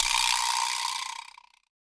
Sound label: vibra slap; Sonic Foundry (Sony Creative Software); Joe Vitale Latin Percussion